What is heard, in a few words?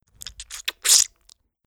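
blood, SKWL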